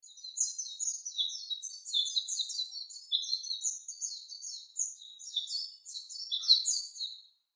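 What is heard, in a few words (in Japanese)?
メジロ, 鳴き声 鳥 野鳥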